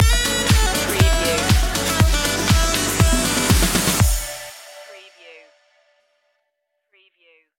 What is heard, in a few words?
sax me up stinger